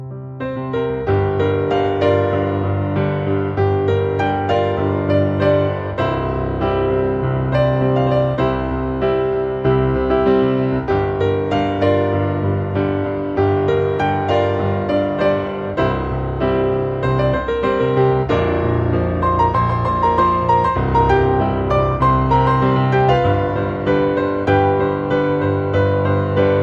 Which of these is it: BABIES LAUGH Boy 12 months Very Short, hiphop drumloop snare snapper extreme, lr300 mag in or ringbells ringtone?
ringbells ringtone